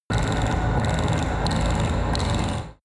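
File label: extrinsic chirp low, energy; energie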